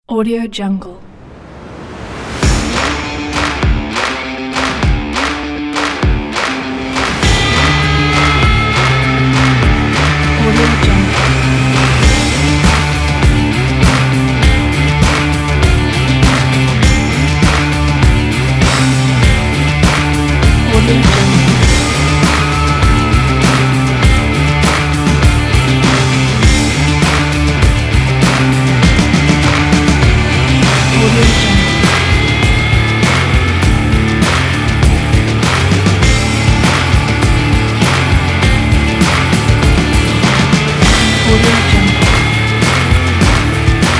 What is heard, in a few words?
a hard rock